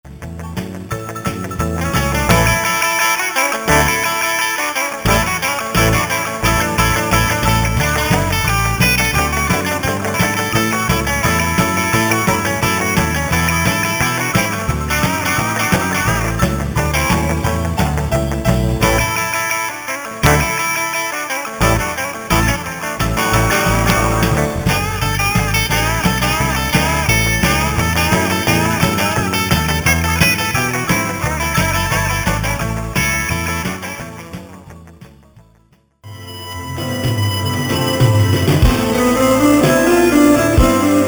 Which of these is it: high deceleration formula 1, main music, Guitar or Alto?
Guitar